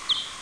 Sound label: serotine, bats